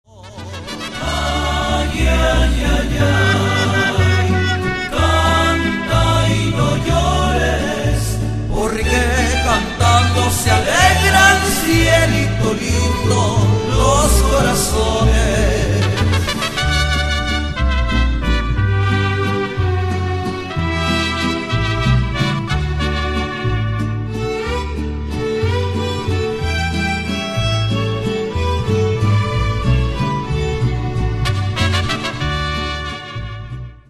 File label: mariachi ay ay ay cielito lindo